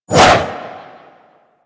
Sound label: Arch Wing Sword Short Whoosh; Warframe; Weapons